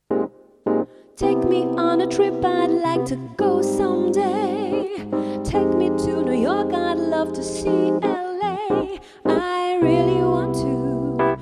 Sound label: Duo, Piano Vocal Live Lounge Style Duo